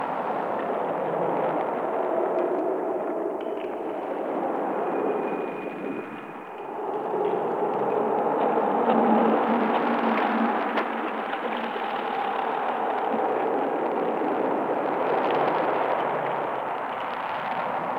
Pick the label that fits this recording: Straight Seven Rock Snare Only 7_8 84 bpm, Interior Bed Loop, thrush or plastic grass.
Interior Bed Loop